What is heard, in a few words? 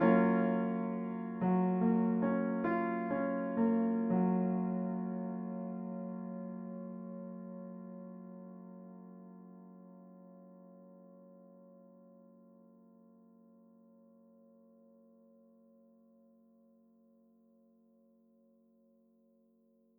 Major 7